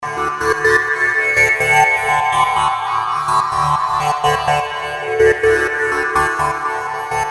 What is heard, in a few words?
melody